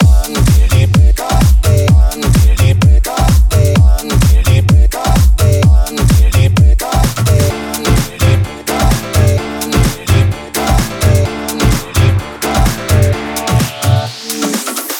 128BPM Brazil Mix; Vengeance Samples; Brazil 2 Kit

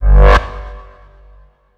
Tonal FX G

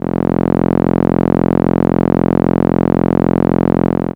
ARP Solina Contra Bass Unmodulated F1; musicradar-synth-samples

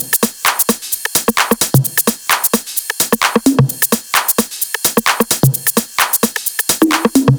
130BPM Kit; Pressure 1 Kit

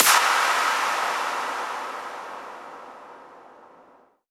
FX Downlifter

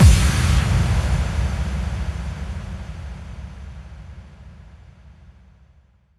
Bassdrums FX, FX Kicks; Vengeance Samples

